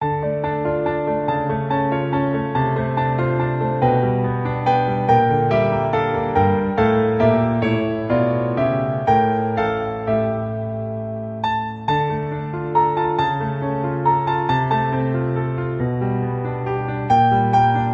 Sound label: Piano Cover